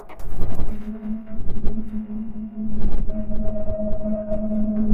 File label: footsteps